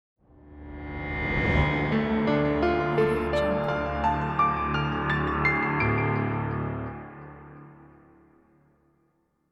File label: ambient piano logo; musicante